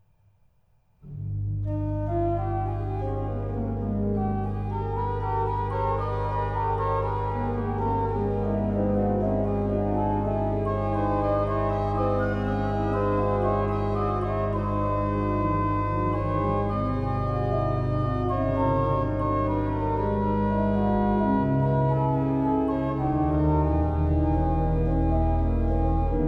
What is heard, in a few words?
Praeludium et Fuga, Organ; Organ Music from Bohemia; Ariadne Musica Praeludium et Fuga XII (J. C. F. Fischer)